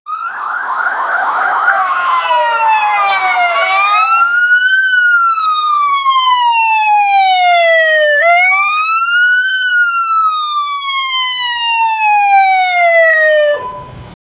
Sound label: sounds of struggle and po, arrest